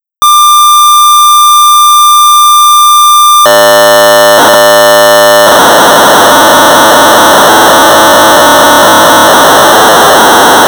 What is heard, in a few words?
Sorcerer 1200 baud; LABYRINT Maze generator; BASIC